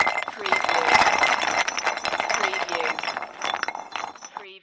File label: Debris Rock